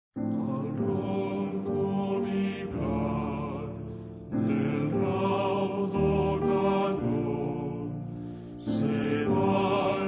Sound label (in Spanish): Canto nocturno en las trincheras